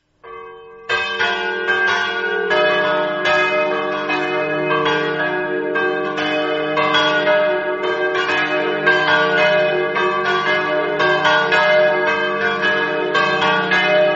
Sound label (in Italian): campane; manzano